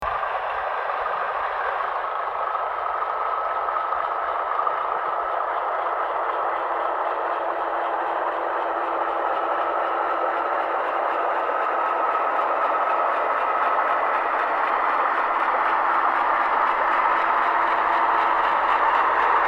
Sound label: Venice Grand Canal By Hydrophone